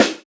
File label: Rap Snare; Snares